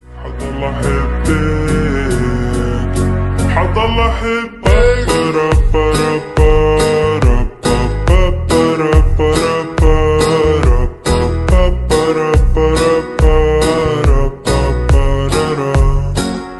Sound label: slowed reverb